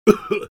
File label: Engineer pain sharp, es